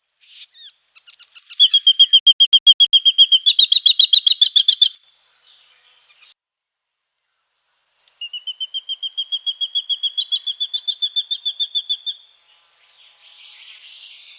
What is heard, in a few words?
Brown Goshawk